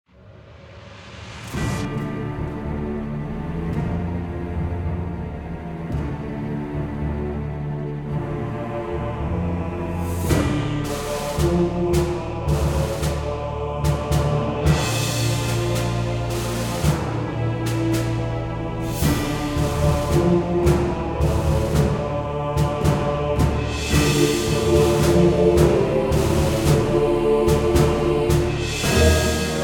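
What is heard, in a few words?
Humans Ambience; Disciples III Reincarnation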